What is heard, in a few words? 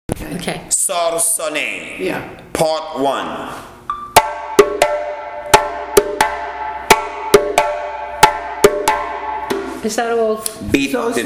slap tone slap; lesson